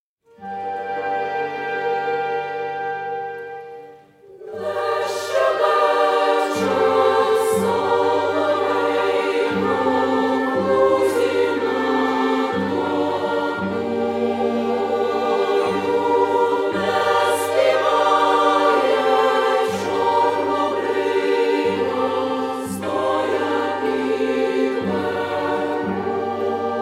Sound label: instrumental